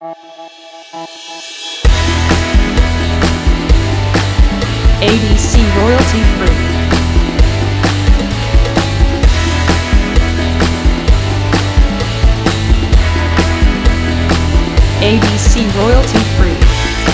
Modern Latin Indie Rock

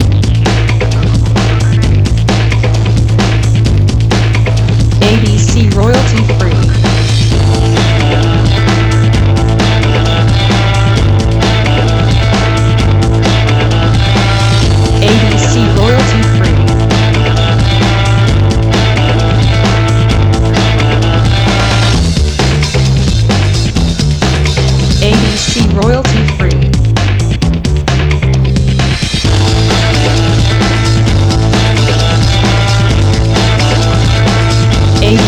Guitar Breakbeat LO FI

